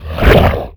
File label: attack, abomination; Fate; MONSTERS